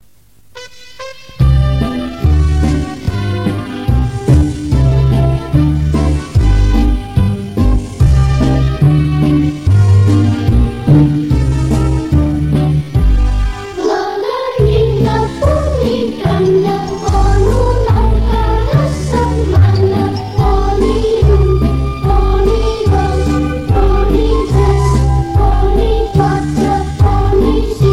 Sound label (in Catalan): La gallina ponicana Flab lenta